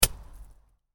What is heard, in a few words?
Snowball hit, Snowball, Impact Wall; Weather; Stuart Duffield